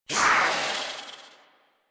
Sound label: Kavat Vocal Attack Medium; Warframe; Khora